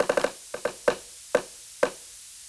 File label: chand creak; dod